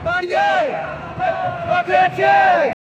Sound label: distant crowd chanting; activism